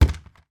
household plastic clothes iron put down on ironing board